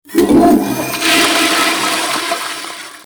Toilet flush

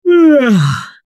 Yawn

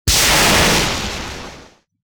lightning strike

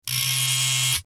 Electric razor

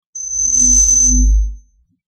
Hologram activation

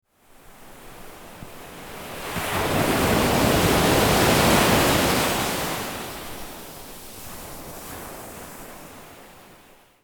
Beach

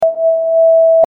beep

